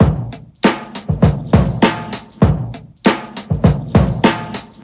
99 bpm drum groove d sharp key, Free drum loop sample - kick tuned to the D# note.